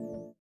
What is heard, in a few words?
VIBRA; Spectrasonics; Metamorphosis